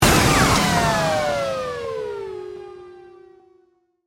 Hit Digital Descend, Hit, Digital, Descend, Wind, Down; Hits